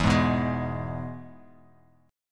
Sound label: E-Major E, Bass Standard; strummed; JGuitar